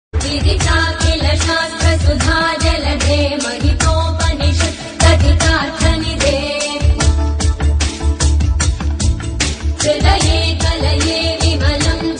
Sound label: remix devotional song